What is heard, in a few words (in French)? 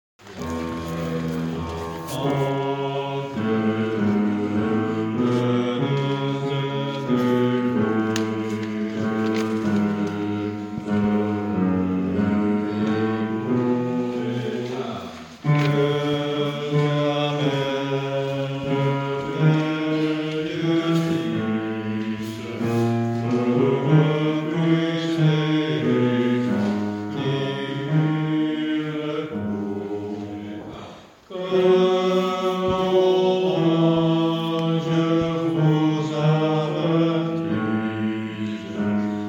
Le adieu des Bergers Bas